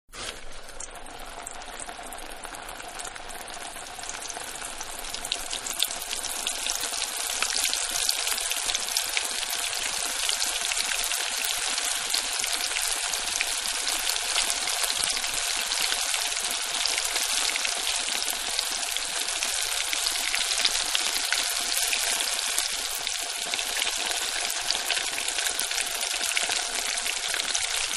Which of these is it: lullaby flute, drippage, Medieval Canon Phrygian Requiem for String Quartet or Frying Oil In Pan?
Frying Oil In Pan